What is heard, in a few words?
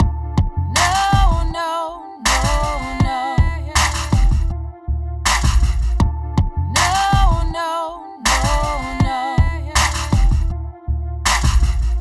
Heavy Weight, free loops